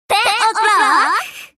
clock

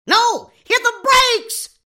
train brakes

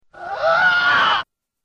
wilhelm; reverse

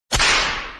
whip; reversevape

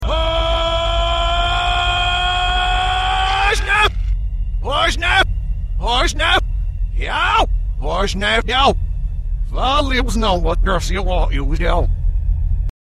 why banjo; reverse

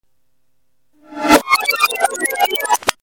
gamecube

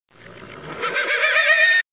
horse; reverse

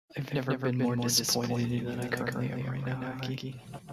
disappointment; cave

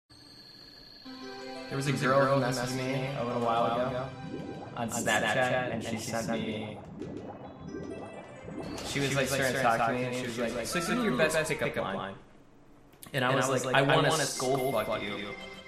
smooth; cave

